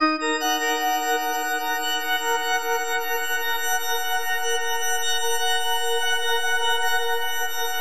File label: FM D# MIN; Bolder Sounds